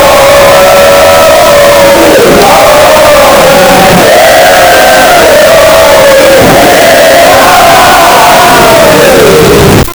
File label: people chanting; kpop